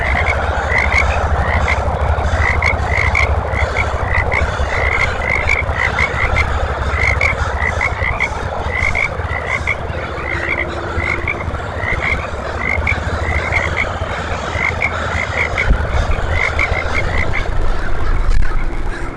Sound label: swamp, bird songs of WA calls